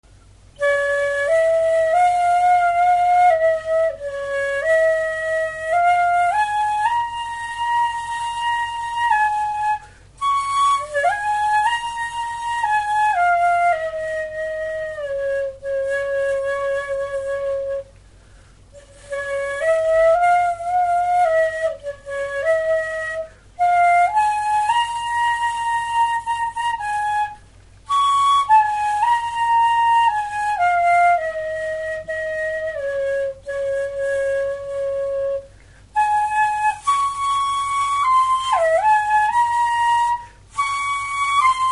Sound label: flute D; sally